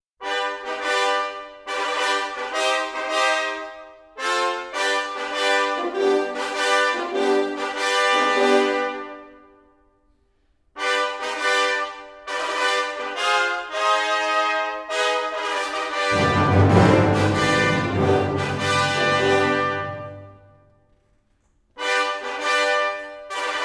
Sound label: fanfare